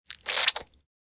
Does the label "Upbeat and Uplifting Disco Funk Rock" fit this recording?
No